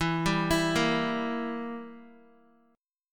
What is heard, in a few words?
E Diminished down Guitar; Edim arpeggiated; JGuitar